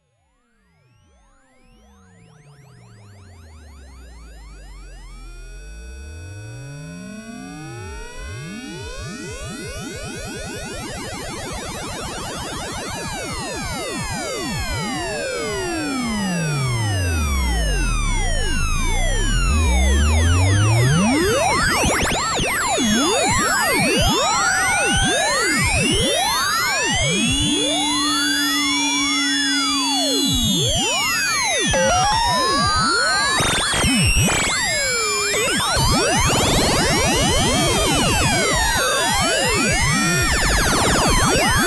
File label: Novation Bass Station II Filter FM